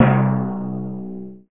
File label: drum timpani; doom; Wolfenstein VB; doom original sfx